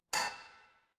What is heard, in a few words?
door doorbell